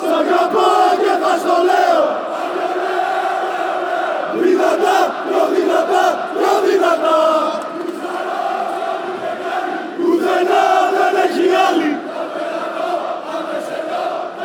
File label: aris chant